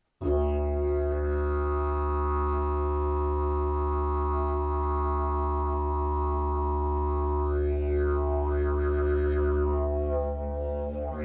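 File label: pvc D, didge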